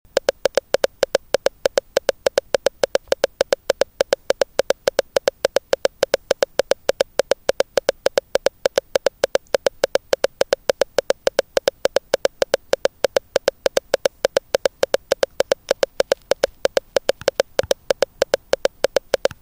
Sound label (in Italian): rumore